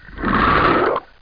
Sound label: monster; Spookslot Tunes Effecten